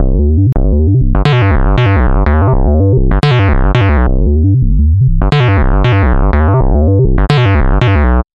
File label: Square OW Bass